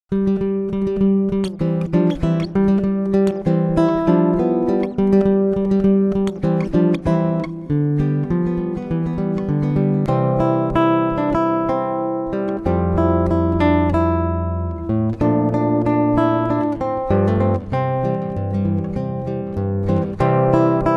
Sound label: Guitarist